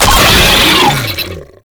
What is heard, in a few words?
Proto Infested Brachiolyst Player Spotted, Vocals, Enemies; Warframe